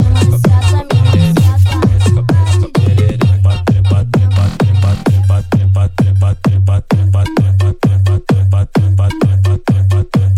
funk bass

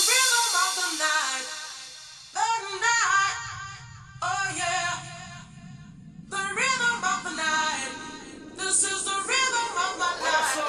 sound car

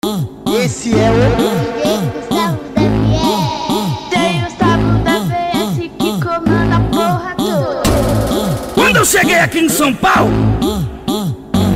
especial funk

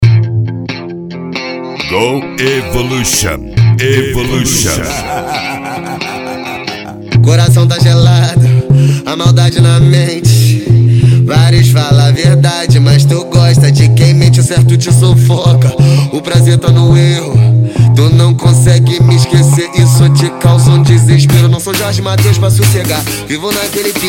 funk tumdumdum funk bass

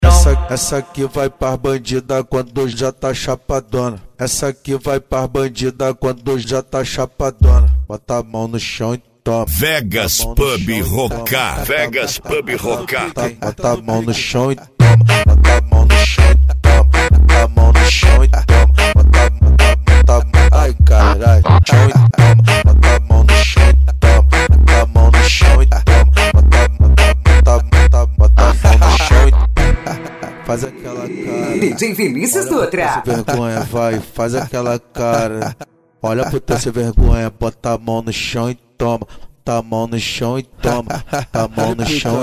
funk mega bass

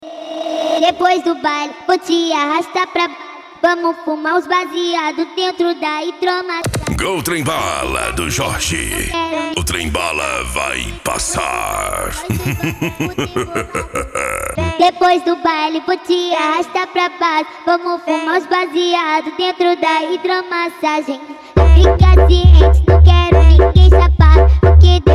funk mega bass

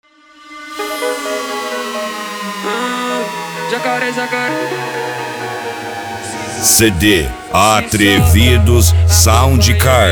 funk

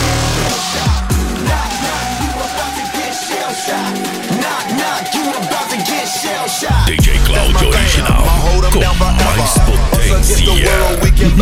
hip hop remix; batidao tuning

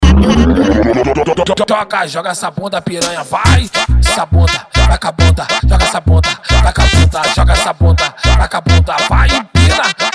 funk

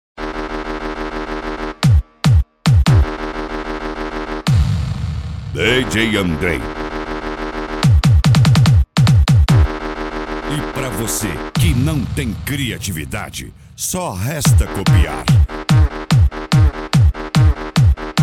pancadao funk bass

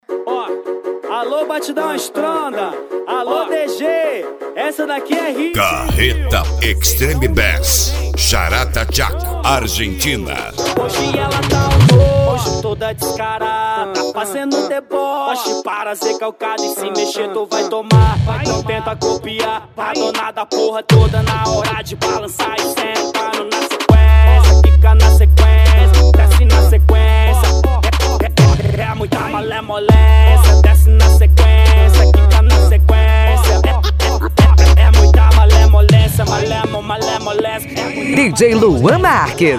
extreme bass; carreta

